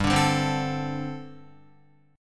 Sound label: G Suspended 2nd Suspended 4th, Guitar; Gsus2sus4; strummed